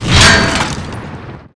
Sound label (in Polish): kolec wysuwa sie; spawn; the monster plane; kolmods